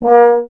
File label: tuba; Miroslav Vitous - Solo Instruments VOL-2